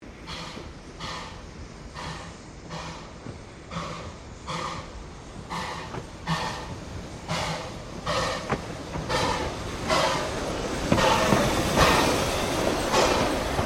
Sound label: Train NA Class Pass, Steam train; PUFFING BILLY 1908; Melbournes classic Puffing Billy Steam train; Trains; Vehicles